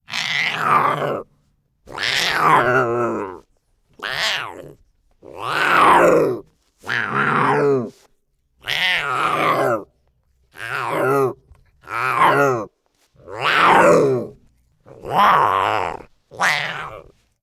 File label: Tiger Cub Makes Deep Growls and Meows; Tigers; Sound Ideas